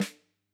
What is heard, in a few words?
close Snr, drum